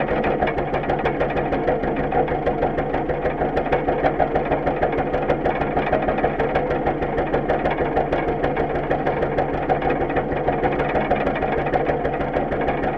Kramer KL11 Contact Mic Foot Space Medium Driving Loop, Oldtimer Tractor; Kramer KL11 Oldtimer Tractor (1955); Sound of Essen